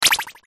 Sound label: open; OpenBox